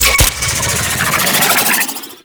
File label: Fluid Sac Device Activate; Warframe; Infested Eris; Encounters